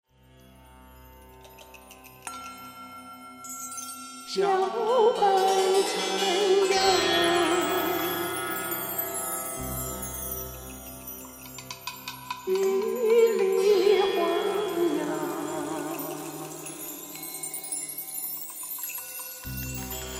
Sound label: zen far east sounds